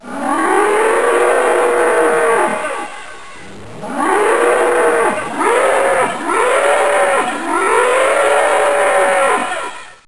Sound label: Tyrannosaurus Roar; Saban